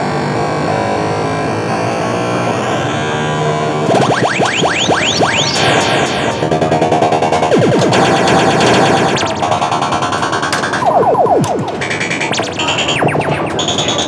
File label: AMUSE, Back Ground, AMUSE PARK; AKAI S6000 CD-ROM - Volume 6